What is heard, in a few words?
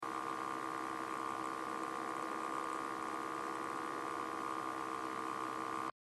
buzz